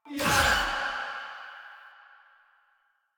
Choir Erupt Proj Throw; Warframe

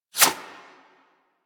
CY Xbow 2D Whoosh B; Tenno

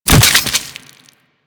Cluster Explosion; Warframe; Infested

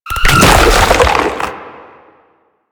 Octopede Horrors Charge; Warframe; Man In The Wall

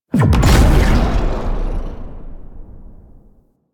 Cloak Remove; Warframe; Warframes; Frumentarius

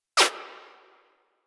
Revolver Pistol Whizz

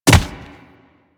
Infested Charger Step Default, Footsteps; Warframe

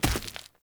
Walk Default, Footsteps; Avakot; Friendly

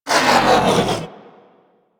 Ship Advert Bot Hover Stop; Ambience; Props; Warframe; Corpus Ship Remaster

